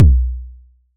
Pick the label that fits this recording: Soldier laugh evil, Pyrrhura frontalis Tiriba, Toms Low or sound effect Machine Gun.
Toms Low